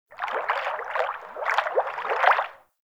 Fish Tank Movement

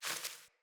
Sparrow One Wing Flaps

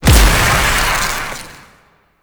Impact Medium; Weapons; Warframe; Duviri; Incarnon Adapters

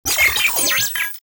Sniper Drone Vocal; Lasrian Drones

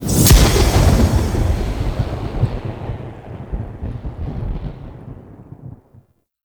Death Burst; Enemies; Abilities; Ethereal; Warframe; Zariman; Void Angel

